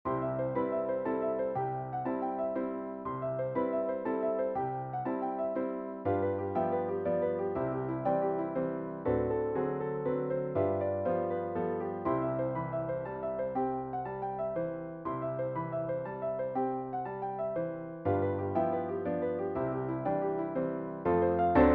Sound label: piano duet; Sparkling Diamonds